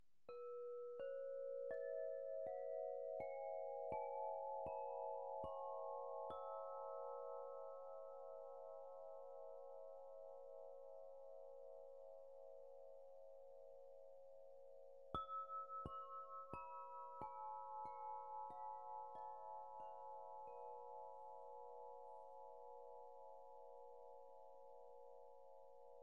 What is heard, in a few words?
Earth Om Cis 432hz, Crystal Harp; Harfa krysztalowa Ziemia OM C B